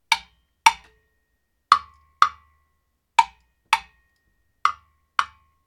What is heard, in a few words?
wooden power blocks and jam blocks studio sample; percussion; loops